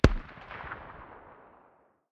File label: hmg dshk far distance, 12.7x99mm; tacz-tweak-pack; taczmechanics